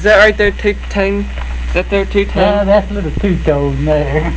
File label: 2 TONE; Mr. Davis